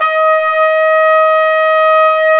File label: WND FLG SO, Hybrid Winds Flugel & Sop